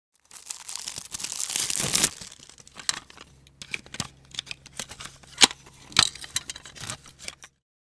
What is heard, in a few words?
Cassette case opening unboxing plastic